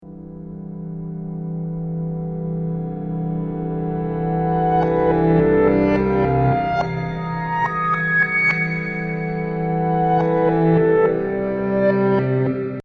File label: counterclockwise fifths time